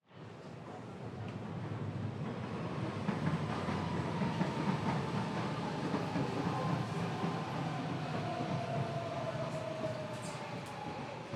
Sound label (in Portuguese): Metro Chegando na Estacao; maquinas; da Feira do Guara